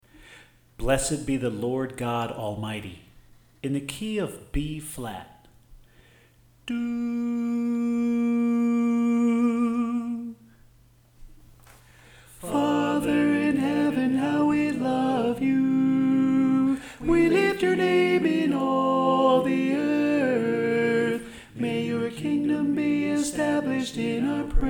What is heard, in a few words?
Alto